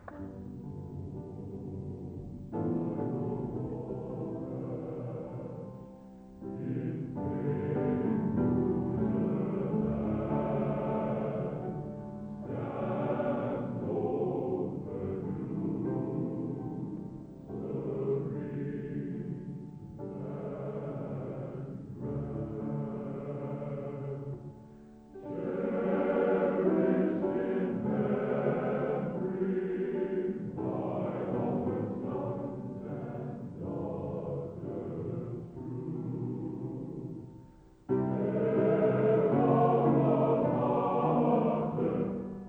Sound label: Recording of Purdue Hymn by the; Purdue Hymn Era: 1990s, Director: Brian Breed; Recording of Purdue Hymn by the Purdue Varsity Glee Club; South Bend